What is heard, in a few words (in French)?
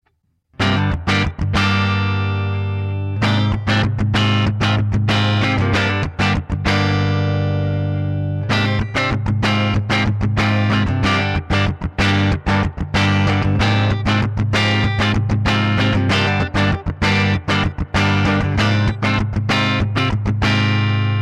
clair rythm; rythmique son clair